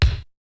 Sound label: Kick; ZERO G - Datafile DISC 1